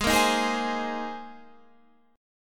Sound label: Bb 9th Suspended 4th Ab, chord